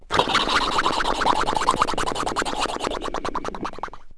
snore; Wired for Sound Pro CD; Aristosoft, Inc.

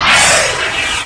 Cloak on; D3DEMO

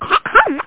undo; Lucky Sounds

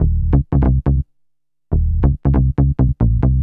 Bass G; Techno; TechnoMusicMaker

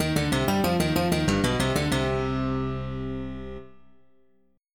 lose

